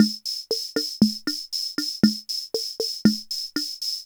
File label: Loop, classic machines, rhythm77